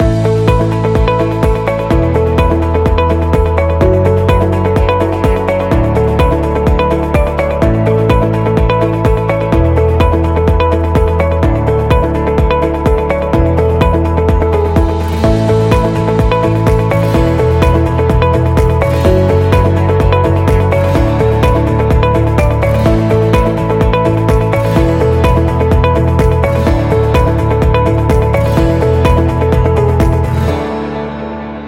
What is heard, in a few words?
Corporate Loop Inspiring